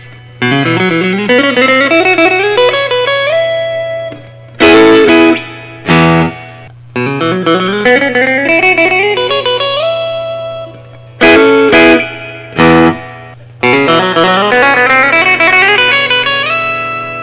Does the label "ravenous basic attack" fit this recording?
No